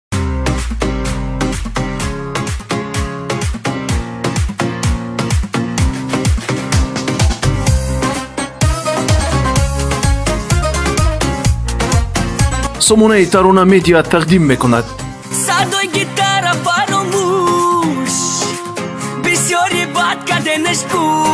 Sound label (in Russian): гитара